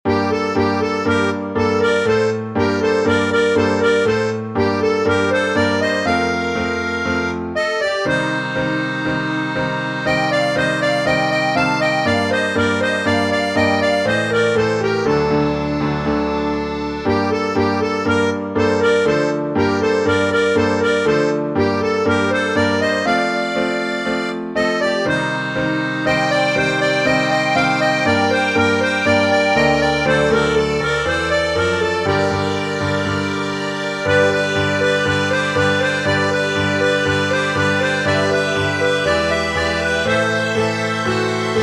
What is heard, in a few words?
Tango